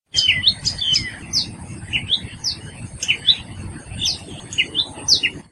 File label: Common Tailor Bird calls adult with juvenile